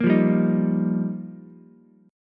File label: G Minor 7th Sharp 5th, Ukulele; Gm7#5; strummed; JGuitar; 3,5,3,6